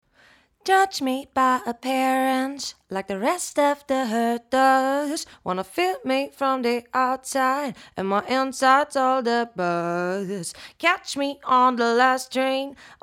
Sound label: fem voc dark tube